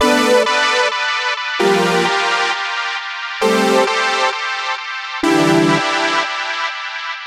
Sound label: Breakbeat Synthesizer; 04 Synthesizer